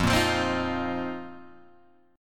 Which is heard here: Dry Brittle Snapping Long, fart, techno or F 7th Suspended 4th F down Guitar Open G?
F 7th Suspended 4th F down Guitar Open G